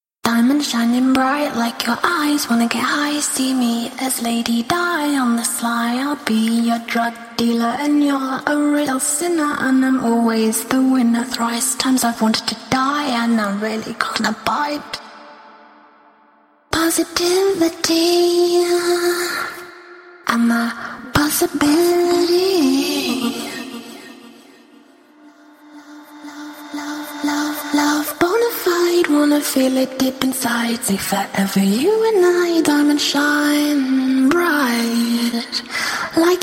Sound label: pop 136 A sharp, Acapellas; Happiness Is A Price